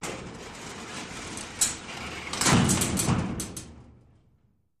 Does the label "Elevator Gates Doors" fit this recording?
Yes